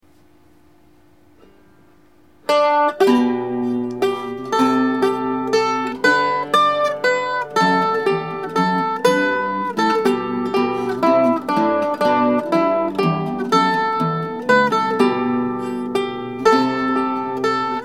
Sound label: mando l cello r